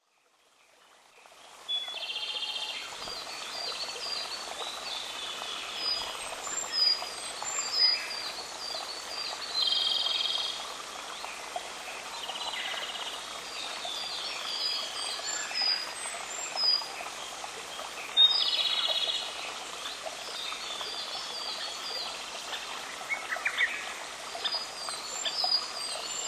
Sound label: murmuring